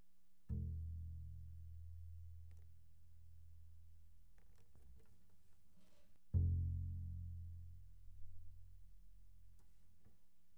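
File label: Bass pizzicato pianissimo sul E E1 B1, doublebass; Strings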